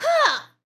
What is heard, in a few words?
backdamage flying; warrior